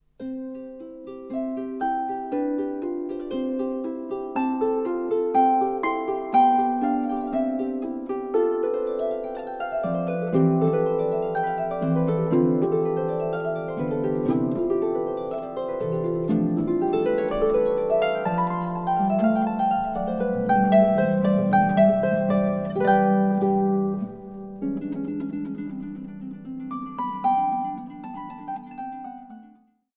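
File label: Sonate Facile Allegro, Harfe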